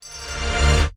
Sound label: dazzle Big Win Intro; fortunerangers